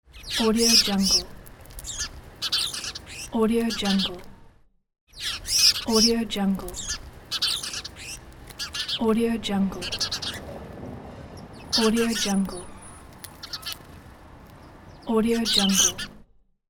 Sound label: birds